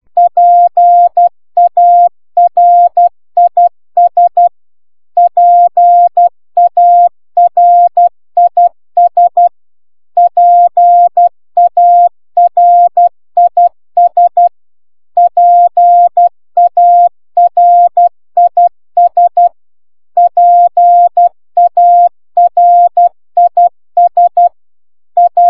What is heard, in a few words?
cw paris